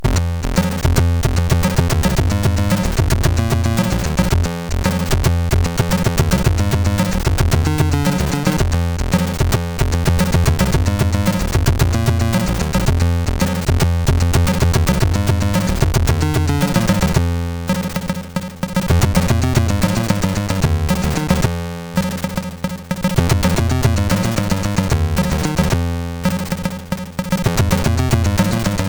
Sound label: Funky, CSG8580R5